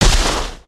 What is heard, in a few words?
jump att impact; weapons; aronwk collection; ndaudio